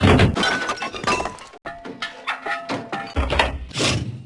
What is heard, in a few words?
tow reload